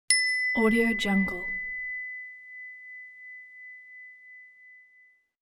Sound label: ding